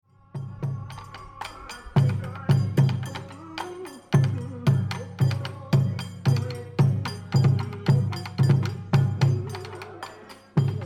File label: Shinto Ceremony Drumming Tokyo, Japanese musical performance; Tokyo, Japan. Traditional Shinto ceremony. Japanese drums